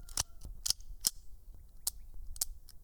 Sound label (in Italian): Archibugio rotto; BLIND QUEST - The Enchanted Castle